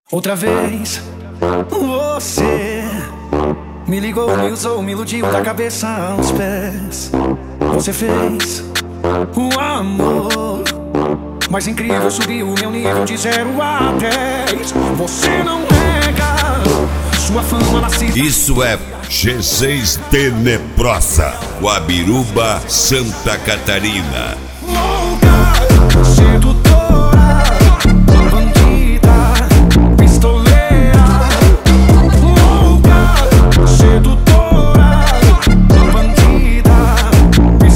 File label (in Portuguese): sertanejo automotivo